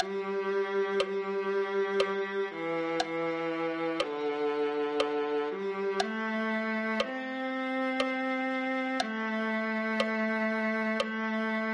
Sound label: viola